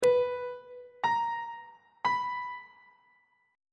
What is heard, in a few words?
a double sharp 8th intervals on treble clef